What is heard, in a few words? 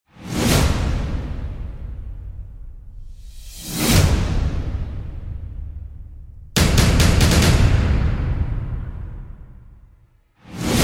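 trailer backends percussion; terraform perc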